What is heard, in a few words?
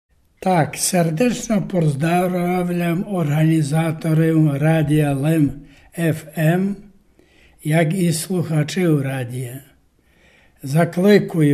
jingiel